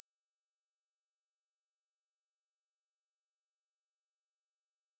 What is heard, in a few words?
saw 10khz -20db; saw wave